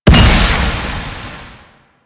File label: Heavy Hammer Overhead Slam; Warframe; Duviri; Dax; weapons